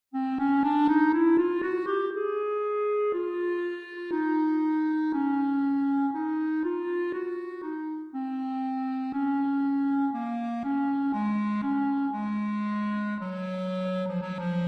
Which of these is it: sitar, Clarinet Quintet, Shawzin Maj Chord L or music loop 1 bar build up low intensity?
Clarinet Quintet